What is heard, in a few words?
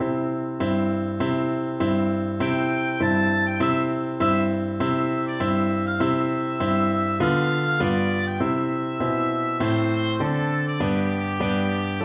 oboe